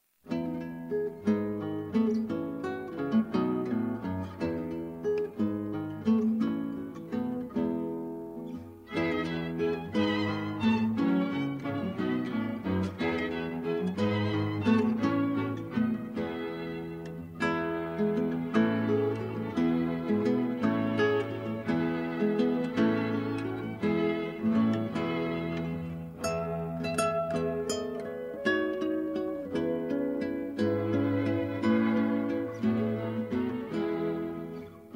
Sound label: Additional Strings